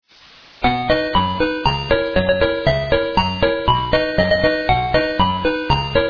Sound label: Swedish Polka